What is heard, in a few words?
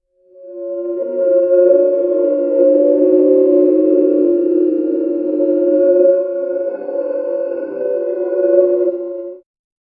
pixel creppy ambience